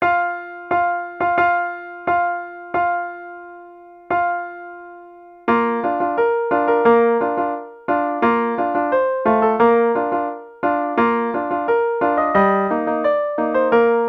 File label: Wedding March Here Comes The Bride Duo Reggae; Richard Wagner